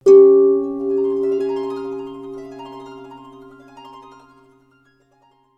sting; minecraft story mode gamerip 2016; Access Denied; Harper

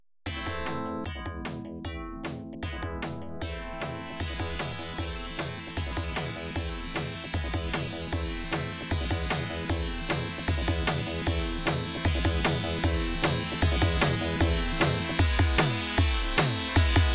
Catwalk Timer, pinball

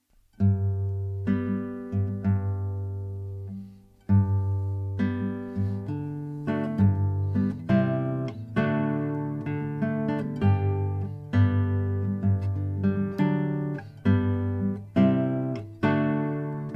Guitar; Assassin's Creed Syndicate

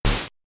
Rapid Cannon Shot

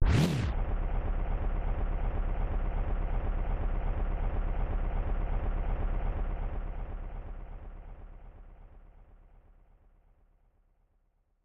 engine; super mario excitebike

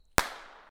Fireworks Pow; blockade classic

